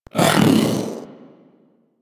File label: Vocal Attack Melee, Pets; Warframe; Zanuka; Zanuka Companion A